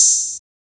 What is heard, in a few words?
OP, Open Hats